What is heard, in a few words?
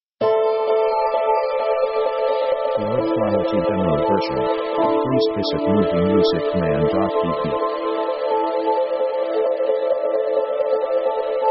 liquid background